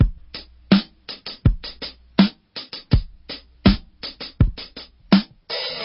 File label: footsteps, Boombap drum loops